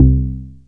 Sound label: BAS C2, 2 Step; USB Soundscan vol.51; House Side Of 2 Step; AKAI; BASS